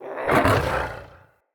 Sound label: Wolf Attack Growl Spawn; Orengall; Animals